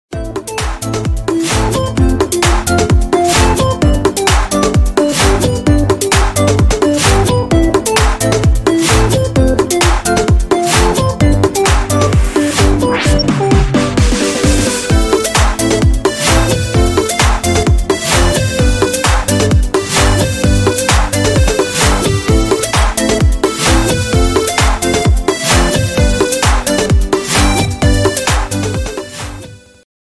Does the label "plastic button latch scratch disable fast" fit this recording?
No